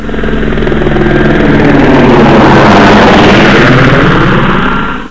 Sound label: heli fly; quake2; coop